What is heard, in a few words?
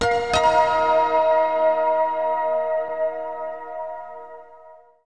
medal bronze, harmonious; Audiosurf; chillout